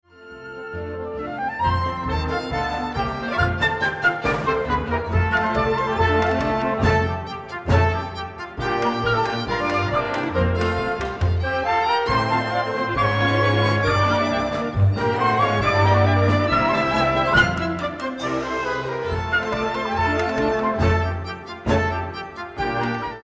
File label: Ragtime Orchestra; Paragon; Gershwin